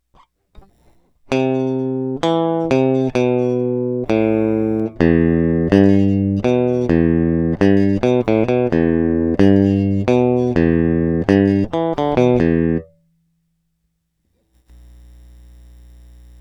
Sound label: Elect Guitar